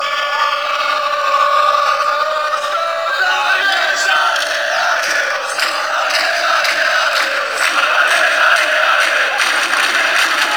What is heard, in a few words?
allez allez, chants; standard liege